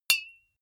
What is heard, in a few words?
deodorant hit hard, metall; maschinen; soundpool